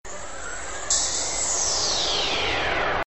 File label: Space Craft